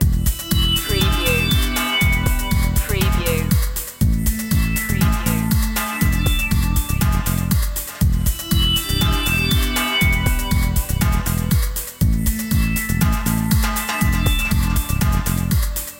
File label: trend setter loop